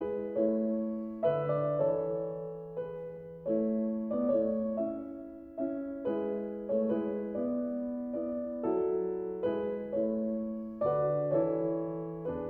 Andante grazioso (piano); Mozart

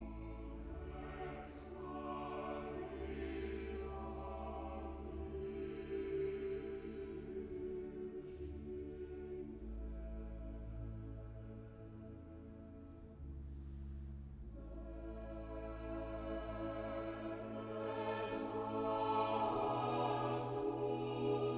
Ave verum (choir); Mozart; st.J college Cambridge

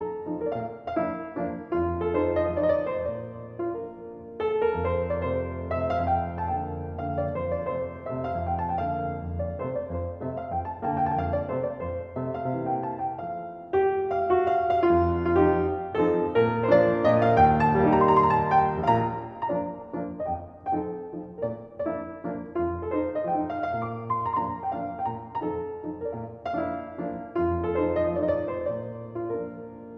Mazurka in b fl major

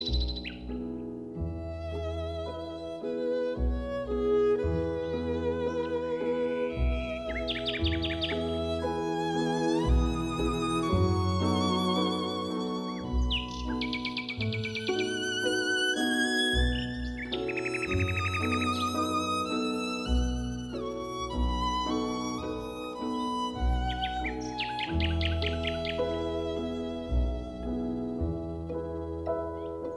Salon Orchestra

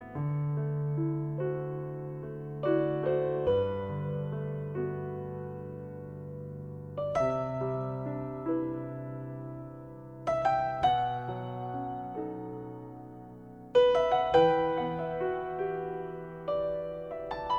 piano

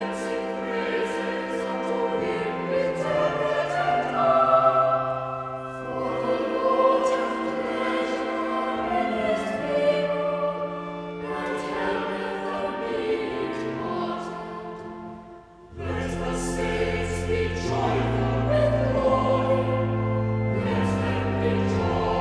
Choir

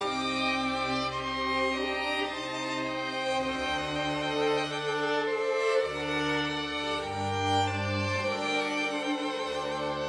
Concerto for 3 Violins in F Major; Telemann

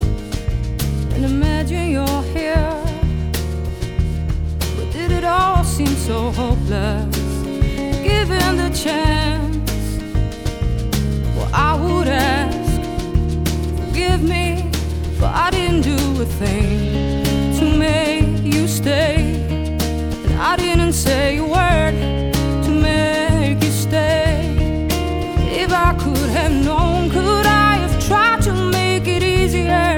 live en Acoustic

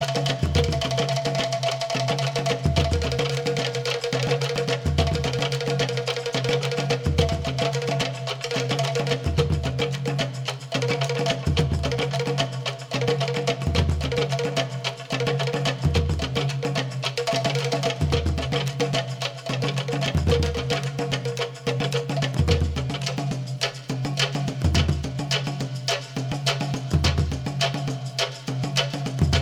Africa Soli Concert de percussion

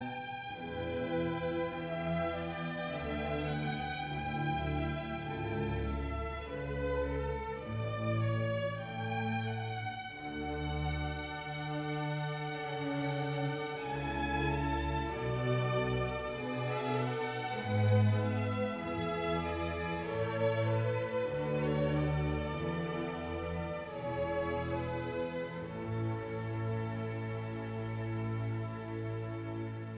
Air G string; BWV1068

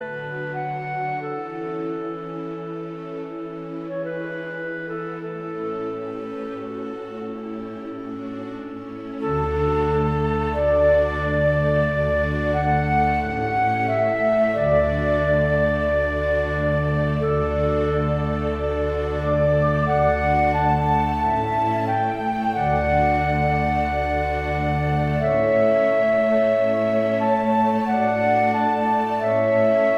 Clarinet conc in a adagio; Mozart; k 622

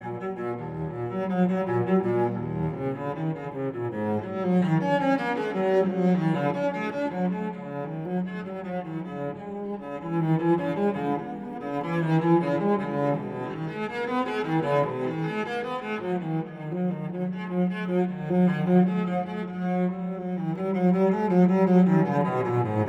Prelude Suite No.1; Bach; BWV 1007

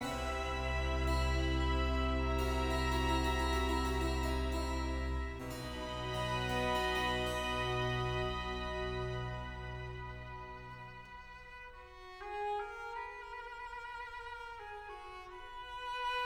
Concert in D Largo; Vivaldi; RV 392

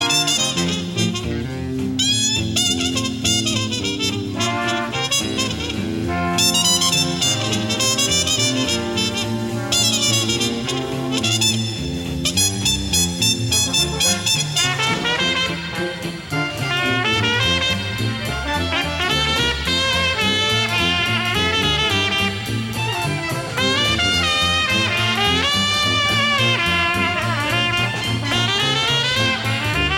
Dixieland

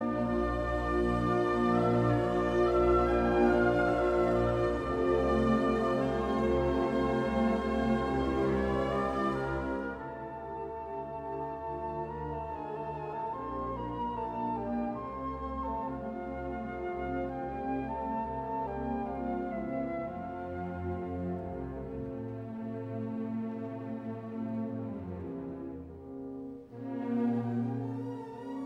Andante cantabile; Mozart; K 551 jupiter

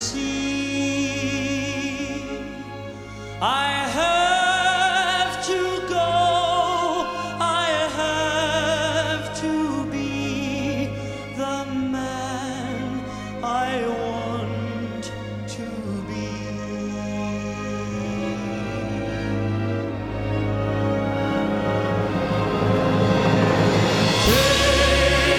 duet live

